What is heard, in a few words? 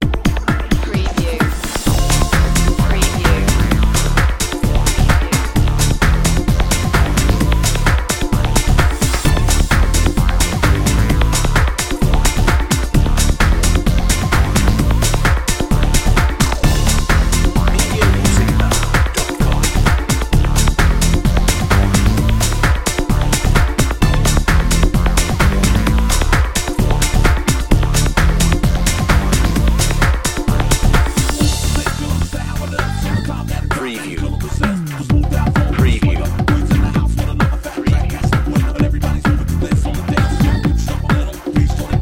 power beat